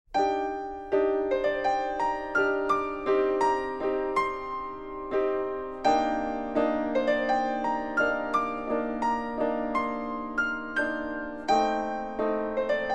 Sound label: Piano